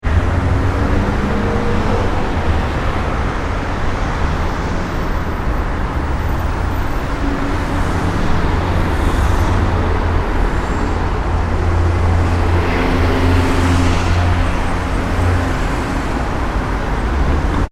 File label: ambience urban traffic town busy fast; Town Traffic Ambience - Busy Fast Moving; Traffic and Cars; McKinneySound